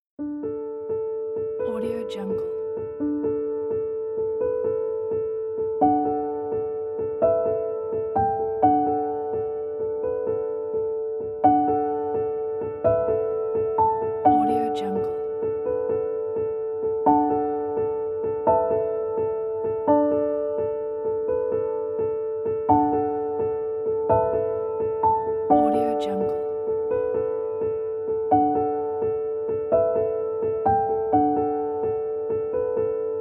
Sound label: inspiring minimal piano